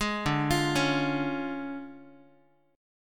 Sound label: D Minor Major 7th Flat 5th, D, Guitar; DmM7b5 arpeggiated; JGuitar